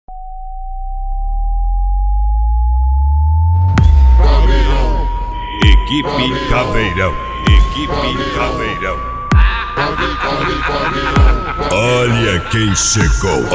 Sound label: funk mega bass